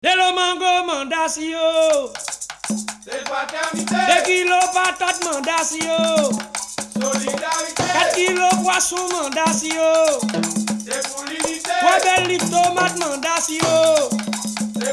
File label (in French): rythmes takouta Agouba